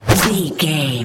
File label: bullet leave barrel